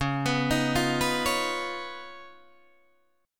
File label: C sharp 9th C sharp Guitar Open D